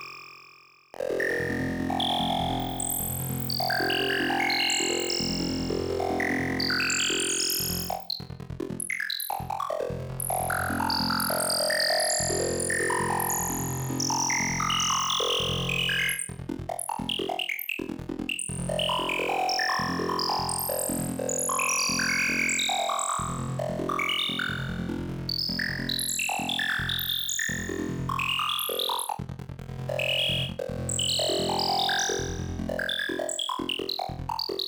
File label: Comb Lead